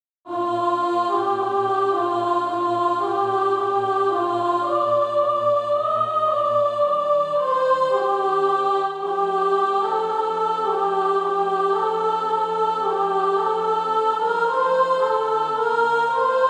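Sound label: soprano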